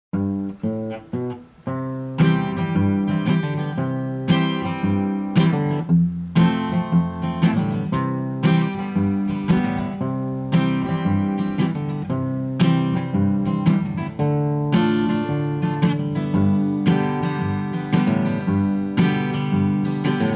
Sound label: Instrumental